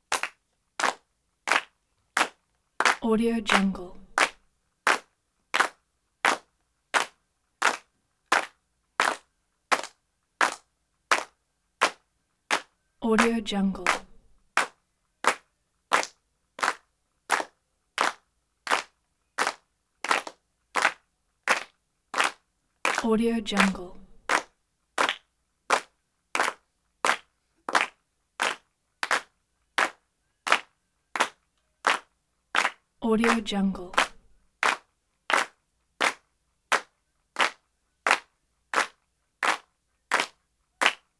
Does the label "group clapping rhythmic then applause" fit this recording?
Yes